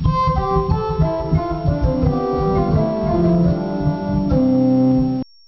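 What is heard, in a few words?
Prinzipal 8, organ; Martin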